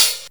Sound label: dry hat sample D key, Loudest frequency: 7150Hz; drum single hits one shots; hat samples